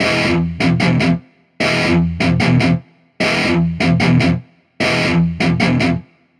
150BPM Guitar Heavy E; Antidote Advent

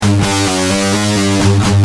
G Heavy, Guitar; Industrial

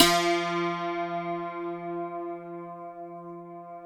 F3, DULCIMER; SoundBytes; Early Patches

